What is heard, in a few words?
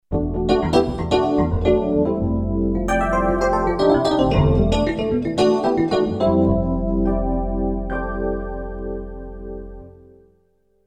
rhodes, Roland JV2080